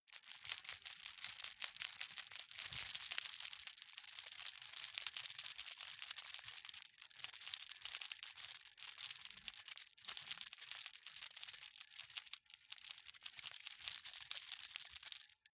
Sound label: key ring large rattle air sequence soft; objekte; schluessel